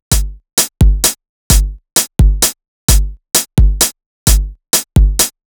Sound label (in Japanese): ヘミオラ